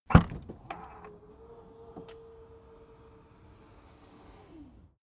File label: car trunk open automatic